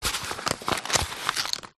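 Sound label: food flour bag paper handling; flour bad paper handling; zapsplat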